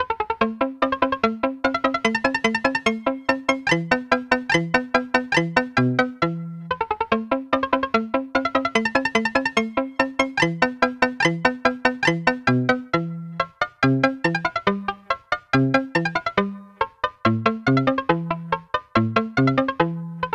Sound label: palm muted guitar